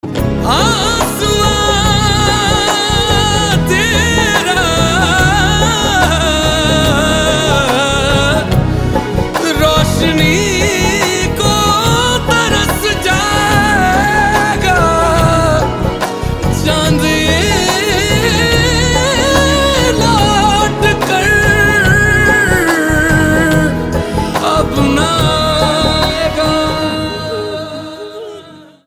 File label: Humming Tone